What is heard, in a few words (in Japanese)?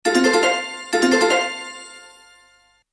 サイレン; okinawa